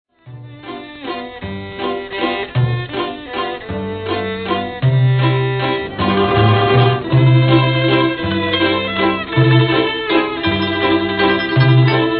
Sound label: Waltz; Kosovo Waltz; Arhoolie